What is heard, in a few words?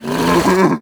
grunt, zombie; creatures